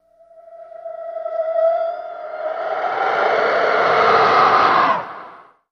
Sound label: Animal Whoosh Growl, dinosaurs